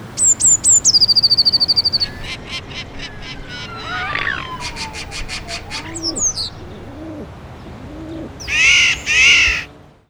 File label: birds of the city sound